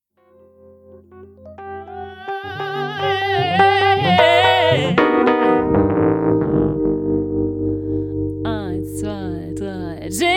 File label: jingle bells